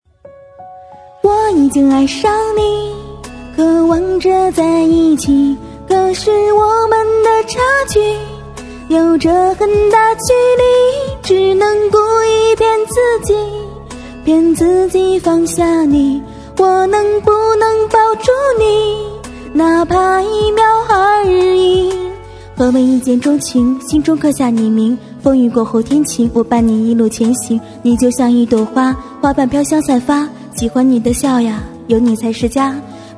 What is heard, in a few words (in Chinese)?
翻唱